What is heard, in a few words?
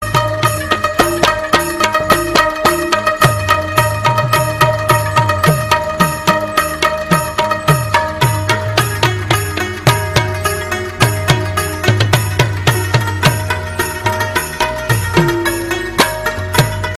dance music pashto; ringtone